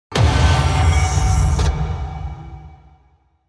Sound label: hit big